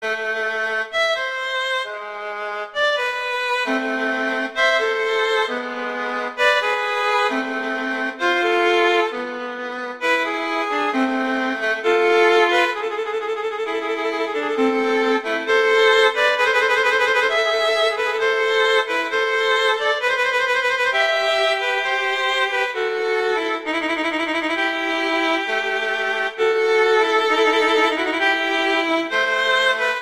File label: Adagio; Telemann; Canonic; Sonata 1